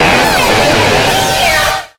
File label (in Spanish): Grito de Dusknoir